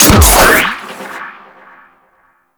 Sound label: Harpoon Gun Charged Shot; Warframe; Sharkwing; Grineer